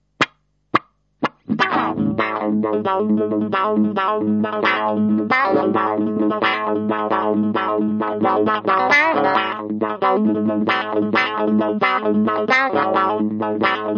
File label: meatball; psst